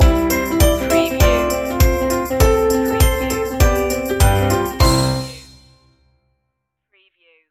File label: one more step stinger